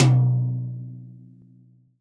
TD-20 Tom B, Roland TD-20 Toms

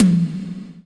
High Tom, Percussion; Giga Samples Collection; ELQ-DrumQit

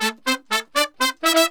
BRASS SALSA; Best Service ProSamples vol.14; AKAI

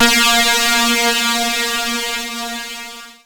PLUCKED, Synclav FM, A#; Big Fish Audio

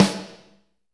SNR, Drumscapes Roland

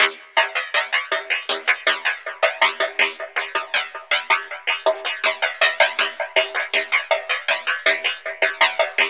jungle robot; Zero G; Malice In Wonderland

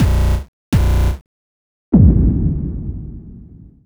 Robot Thunder, Modular Electronica

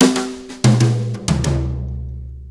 Brushes Fill

